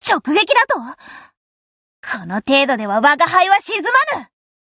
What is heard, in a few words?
Tone Major Damage